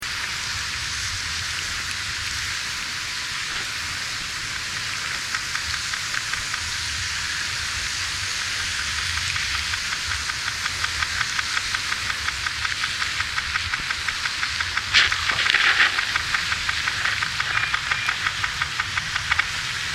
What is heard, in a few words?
Ice